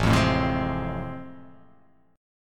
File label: A Minor Major 7th Sharp 5th